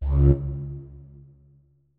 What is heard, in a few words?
flute notes; Hideout Furniture